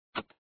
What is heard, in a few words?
ROTATE; dig it